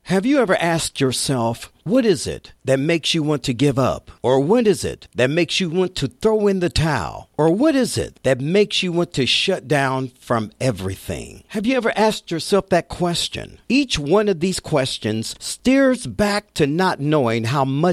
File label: Motivational